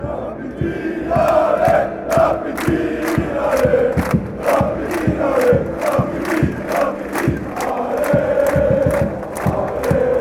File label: rapid wien ole, fanchants